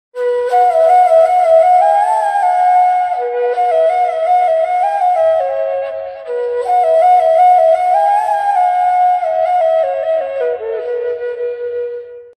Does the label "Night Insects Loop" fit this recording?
No